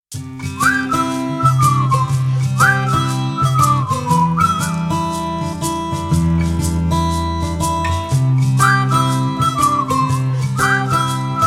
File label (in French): Sonnerie